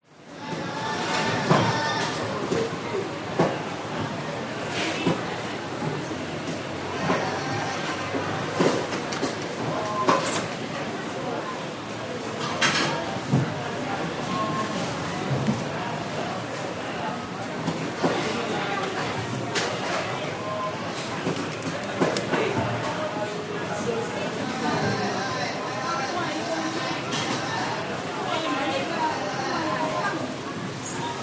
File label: wet market singapore busy people shouting